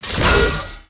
Drill Golem Attack

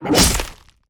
Flying Chicken Attack

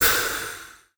Chloropixie Attack

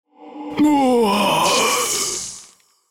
Rogue Wave Death

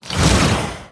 Dragon Turtle Attack

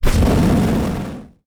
Robo Attack

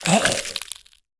Bone Warrior Death

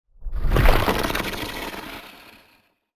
Deepcoiler Death

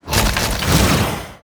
Hydra Attack

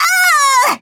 Imp Death

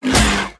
Dire Toad Attack

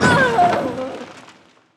Cage Of Pain Death

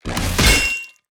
Drill Golem Attack

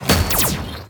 Millennium Attack

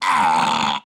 Dark Mage Death

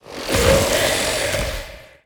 Kobra Death

